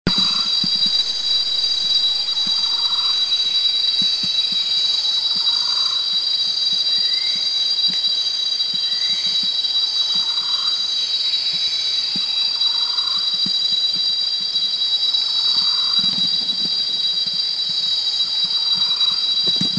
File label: Green Broadbill